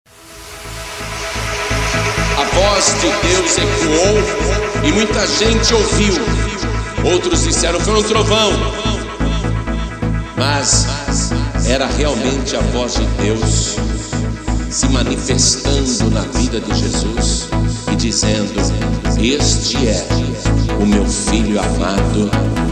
gospel

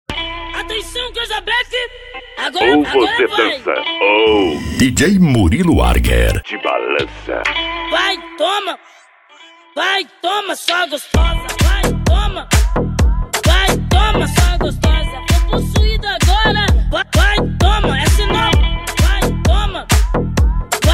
funk

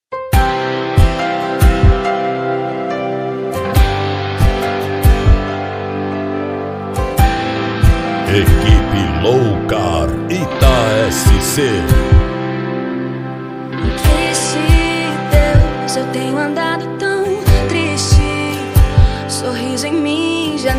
gospel

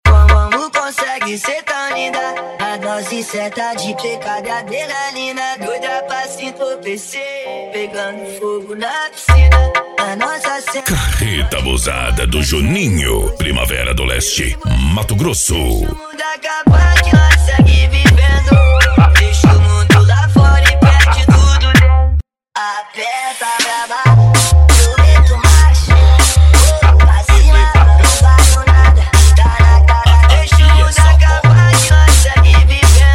mega bass

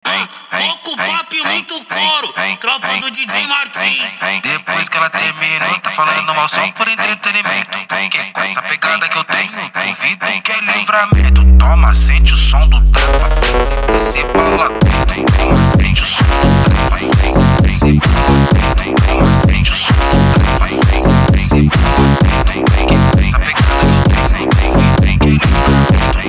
mega funk e funk mega bass

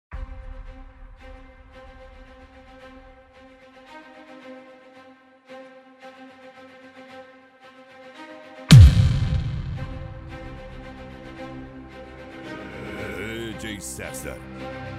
sound style dubstep

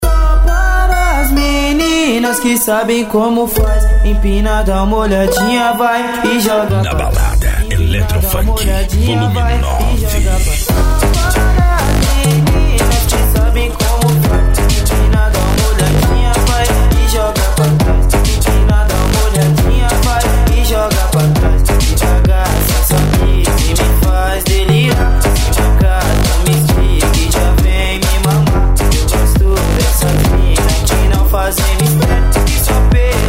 electro funk; na balada

